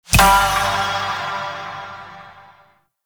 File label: Dice Result Single Six; Warframe; Koumei